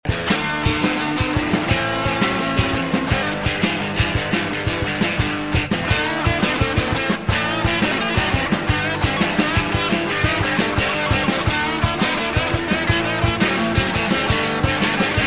Blues Type Thing

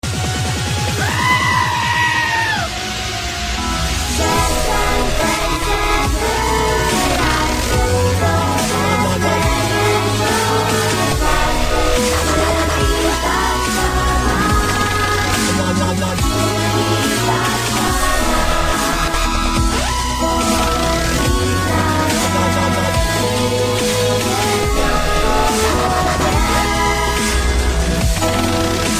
Polish Carol Dubstep

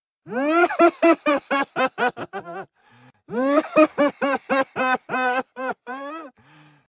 Doctor Laugh; Dbd

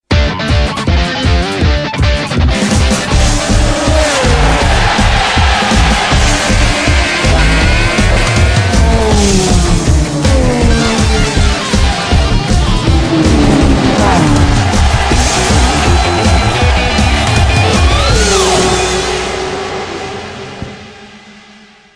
Motogp

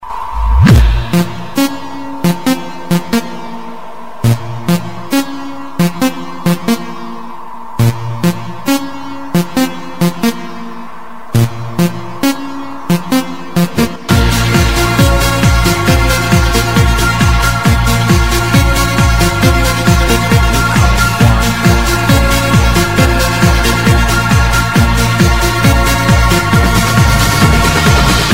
Dj Effect

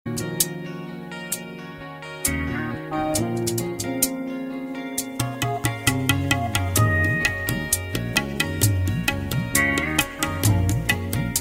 Nice Beats

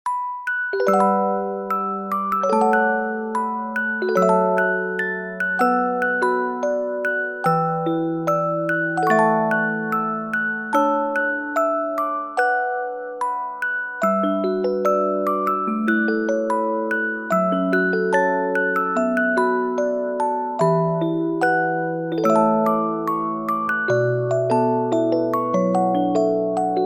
Musicbox

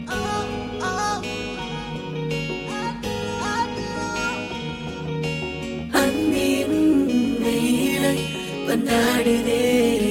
Rap

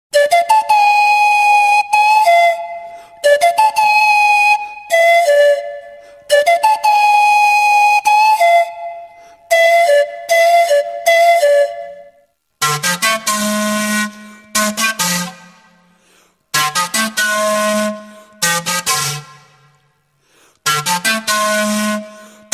Flutes

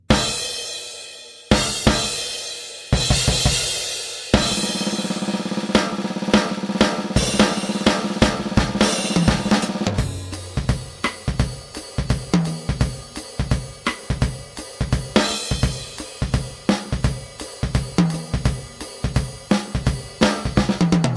Drummer

